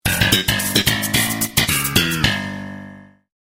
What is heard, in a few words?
sitcom transition bass riff; seinfeld